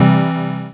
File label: chord